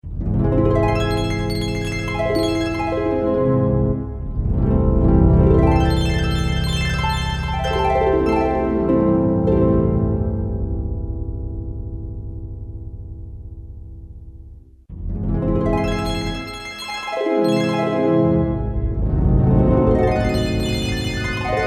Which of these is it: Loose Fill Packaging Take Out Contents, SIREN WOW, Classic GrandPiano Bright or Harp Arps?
Harp Arps